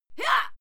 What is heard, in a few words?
female fight scream hyah; schreie; menschen